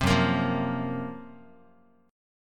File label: Gb Major 7th Flat 5th Guitar